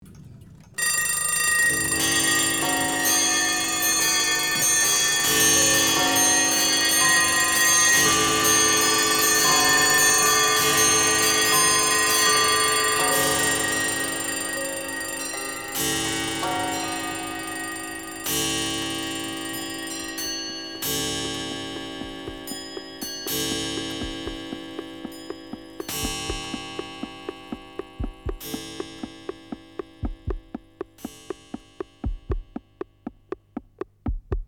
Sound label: Cell Phone Bell